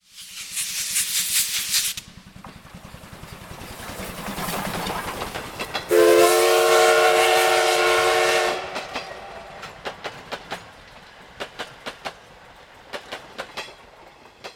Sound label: locomotive pass